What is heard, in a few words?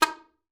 SLPA D#4, ALTOSAX; Xsample VOL-2